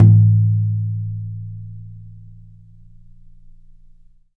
big room tom sound G sharp key, Royality free tom sample tuned to the G# note. Loudest frequency: 119Hz